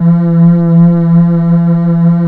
3100 E 4, Korg PS3100; Back In Time Records; Korg Universe VOL-1

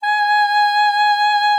G#5, ALTOSAX; Xsample VOL-2; 90 s Sample CDs

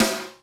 Snare, Yamaha RY20

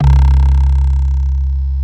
Bass Analog & Synth, C2; GLAVIOUZ; USB Soundscan vol.12; AKAI; VINTAGE

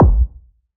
BD, BASS DRUMS; EdgeSounds

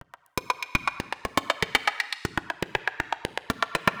Rhythmic (120BPM); musicradar

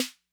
Snare Accent Mid Tone Mid Snap, 808; classic machines

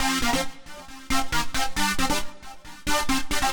Arpune 136 C, future rave samples, 136bpm

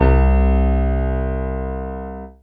PIANO, Dance Mega Synth; Best Service

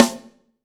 AMB SN, AMBIENCE SNARE

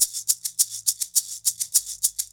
MARACAS; Sonic Foundry (Sony Creative Software); Joe Vitale Latin Percussion

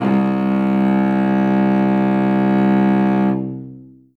Cello solo C1; Best Service ProSamples vol.51 - Classic Orchestra 2

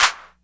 CR-8000 KIT; Rubber Chicken Software Sample Bank; DRUMS + PERC

